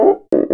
CUICA; ILIO; Synclavier Percussion 2; World & Orchestral

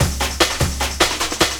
LOOP, Drum & Bass, 150 BPM

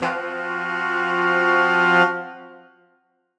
Horn Swell Fmin9; undercover samples

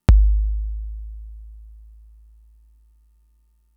Kick, Drum Machines Fricke MFB-522

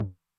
HI TOM, Low Tune Short Decay, 909; classic machines; HT - HI TOM

